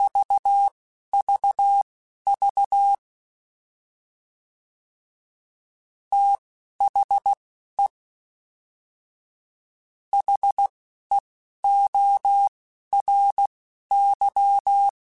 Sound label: TH Runs, Morse Code; CW Code Groups; Mixed; THs; HamRadio; Prefixes